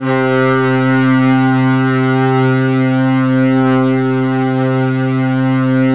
Bowed String Syn; Cymatics; Noise Osc Samples for Serum

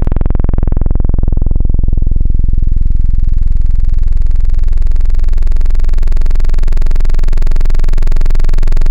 Basics Saw Mellow; Synthetic's Serum Collection; ESW Core Wavetables; Echo Sound Works Core Tables

